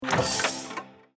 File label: mystery prize symbol; Super For